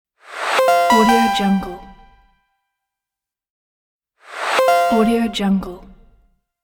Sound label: message signal